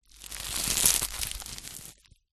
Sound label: food ice pop frozen bend squeeze; zapsplat